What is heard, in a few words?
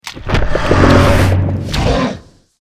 Attack; Boss Femuto; godzilla smash3 mobile gamerip 2014